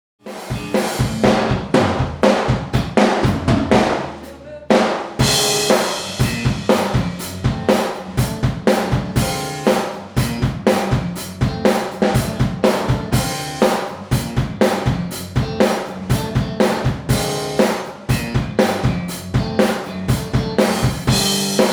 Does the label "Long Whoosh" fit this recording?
No